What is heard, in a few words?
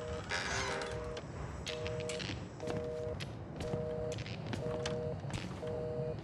object telephone